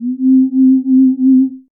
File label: Lead, Operator, Synth Lead; Instruments; Bebe Lead; Ableton; Synth Essentials